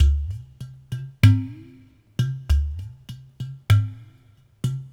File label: Udu, Ethnic Percussion; Sonic Foundry (Sony Creative Software); Ambient Atmospheres & Rhythms